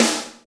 steel snare drum sound a sharp key; snare drum samples

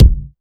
rich mid range kick drum sample a sharp key; Duration 0:01; Samplerate 44100 Hz

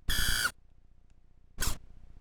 photo camera autofocus

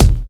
clear kick drum single hit d sharp key

